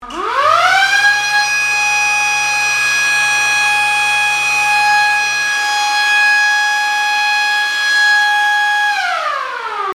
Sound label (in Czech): sirena